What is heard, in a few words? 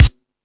Lotsa Kicks, Drum Machines